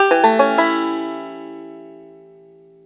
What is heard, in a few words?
banjo arp A1; AKAI S-Series CD-ROM Sound Library VOL-2